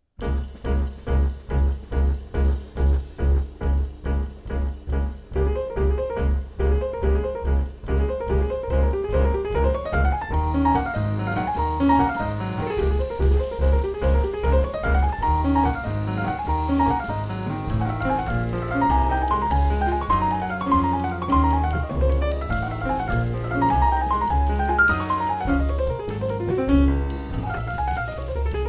Waltz D flat major

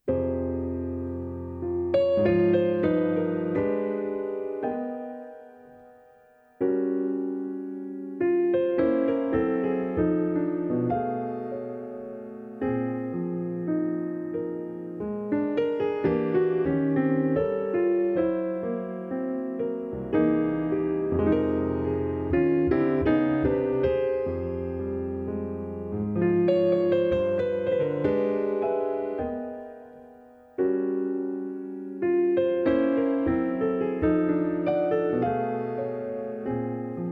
Mazurka in F minor

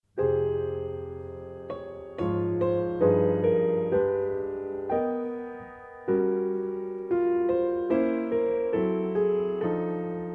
Mazurka f minor

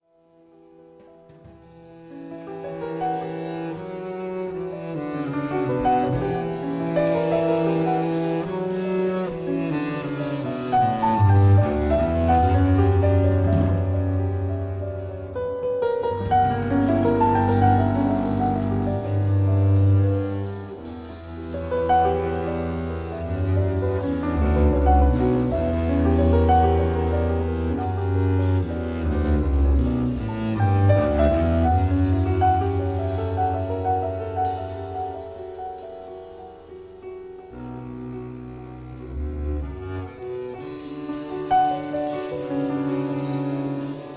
Prelude B Minor

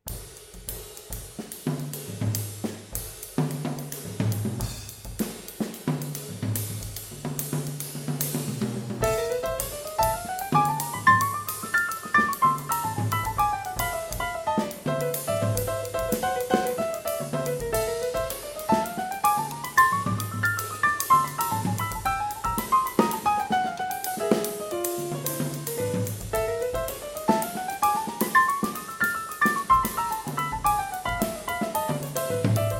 Etude a minor

